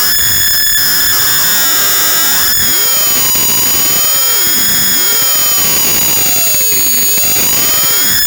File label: static, radio static; Zero-G Ambient 1